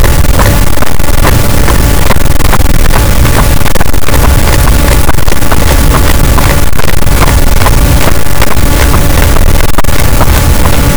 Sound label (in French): cachalot, Physeter Macrocephalus, gaussian noise